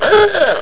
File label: morse Grounder Appear; F.A.R.M. Patrol